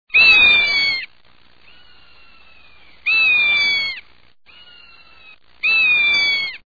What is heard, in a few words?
Rough legged Hawk